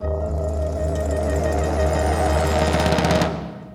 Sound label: near win; netent